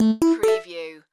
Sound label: Casino Slot Machine Bassy